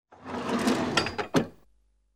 SPORTELLO CASSETTONE

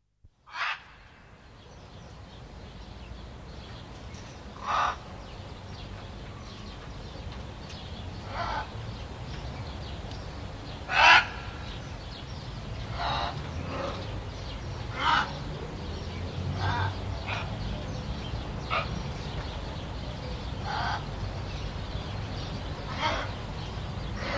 UCCELLI ZOO

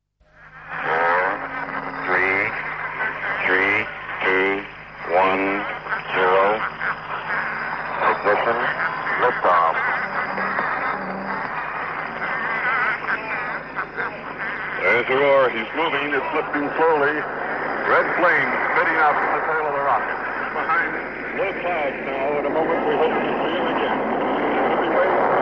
PARTENZA APOLLO COUNDOWN

